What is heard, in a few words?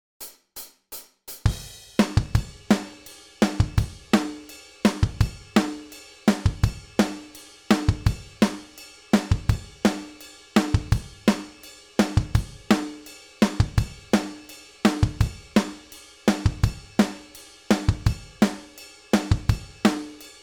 168bpm, bass